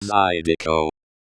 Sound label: zydeco